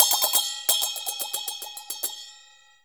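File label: CHINA SM8 R, China cymbal; Spectrasonics - Heart of Asia CD2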